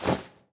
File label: sprint rustle